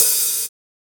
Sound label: Hihat Open